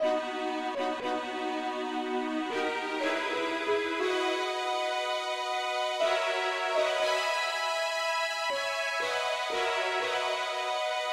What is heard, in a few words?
mandolin Lead key b